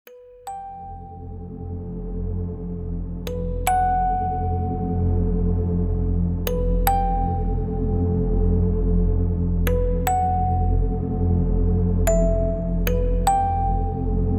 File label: melodies of fear; پکیج کامل افکت صدای ژانر ترسناک